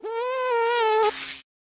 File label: Mosquito flying into an electric lamp